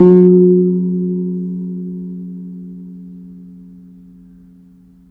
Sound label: gong anak